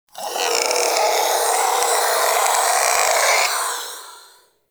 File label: Vocal Idle; Warframe; Duviri; Shadow